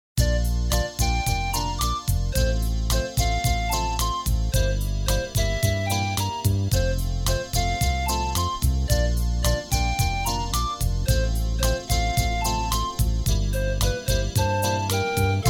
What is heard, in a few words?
Steel Drums and Vocals; Steel Band